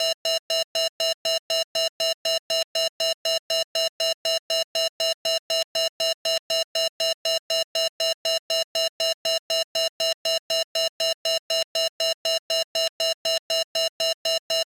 Sci-Fi Alarm Simple Alarm Medium, Futuristic Interface; Justsoundeffects